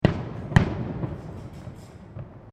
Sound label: Fireworks Multiple Explosions; Backgrounds; Crafted Signals